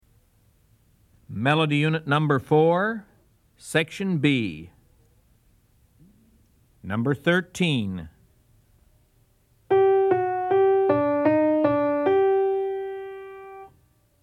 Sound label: melody, ear training recordings